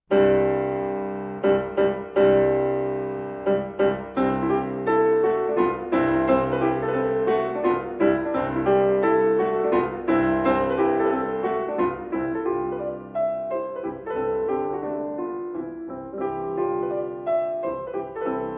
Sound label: Mazurka in C major, Piano